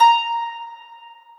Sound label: harp